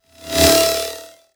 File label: Aggressive Transition